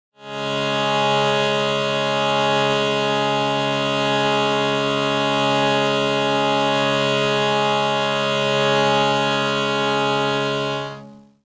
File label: Shrutibox